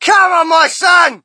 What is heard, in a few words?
crowd, shout; Mike Tyson Heavyweight Boxing